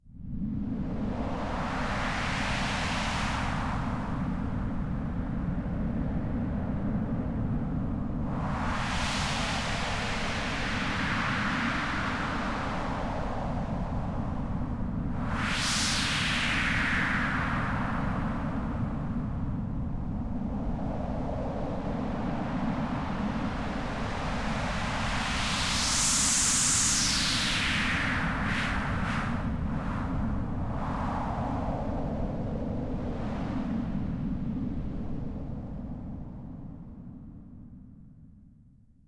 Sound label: Windy!, Korg Radias-R